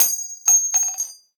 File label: Shell heavy default; STALKER; IWP